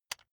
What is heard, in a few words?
electric button drill direction switch backward heavy